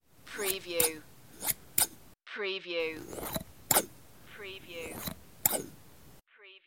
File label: Scissors Snap